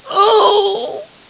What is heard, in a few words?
slave pain; svencoop; aslave